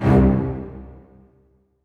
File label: C1, HALL STRINGS; AKAI S-Series CD-ROM Sound Library VOL-7